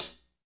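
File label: hi hat; HH-Kits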